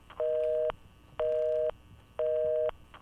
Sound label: busy tone